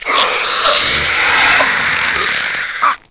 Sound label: impact; ghouls forest